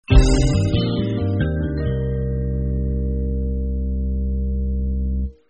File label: level failed jingle; Delicious Emilys True Love